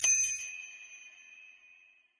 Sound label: pickup gem tinkle; frozen rush